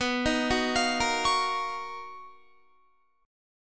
B-Major Sharp 11th B down Guitar; arpeggiated

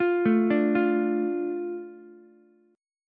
A Suspended 4th Sharp 5th, Ukulele; Asus4#5 arpeggiated; JGuitar

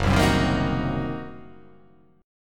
Fsharp 6th Flat 5th down, chord

